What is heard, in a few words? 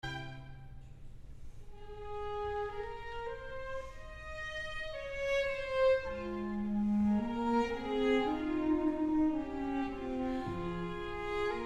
String Quartet in D minor Adagio di motto; Jean Sibelius; Op. 56